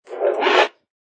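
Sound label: Radio Static Scratch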